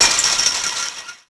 fence door locked; Max Payne

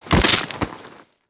deadfall grass

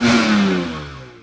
stall, Engine; Splashdown; XBOX

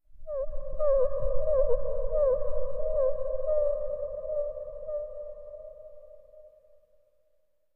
stinger; Myst III Exile

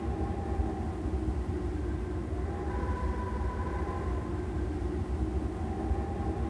Tatooine wind, ambience; Star Wars Obi Wan; XBOX

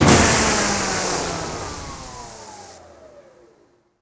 lab door

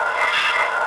rageloop, force

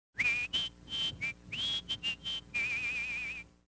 whistle; Soldier Of Fortune II Double Helix; XBOX

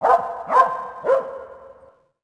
dog; Tony Hawk's Project 8; XBOX; houses

